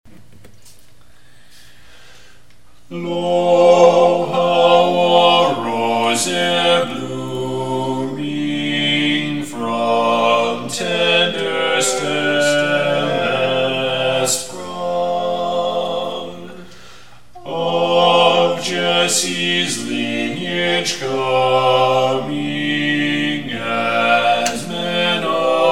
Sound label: bass; lo how a rose eer blooming